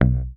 HOFNER MUTE, Bass

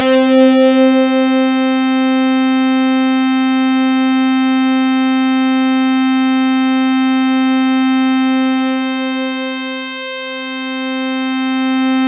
C4, SynthLine

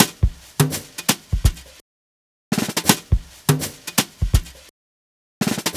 Drums, Cinematic Funk

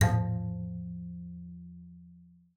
bass, F3; COL LEG; Xsample VOL-5

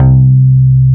Bass Note C

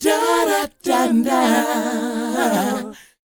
DOWOP F; ILIO; Vocal Planet

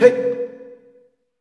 N.Afr.Dumbek; Roland LCDP14 Africa VOL-2; Af.Hand Drm2

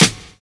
HORSE BEA; Univers Sons

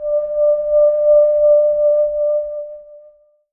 wind hose

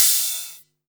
Rides, Cymbals

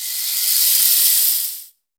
RAINSTICK; East West; Global Sounds

